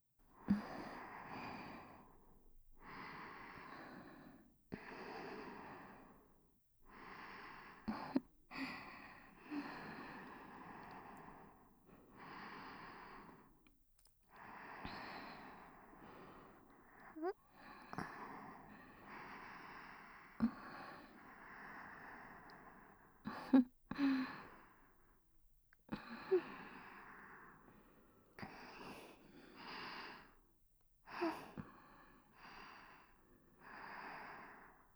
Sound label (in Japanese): W濡れ耳かき（クリーム指耳かき）, ASMR